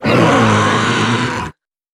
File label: creature vocal